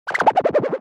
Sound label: Wobbly Motion Design Downer